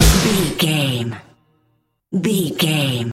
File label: dance your way out fall sting, Dance, EDM, Club, Eurodance; Exercise; Aerobic; Aerobics; Spinning; Workout; Energetic